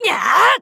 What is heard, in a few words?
kiai; MonsterHunter; Fight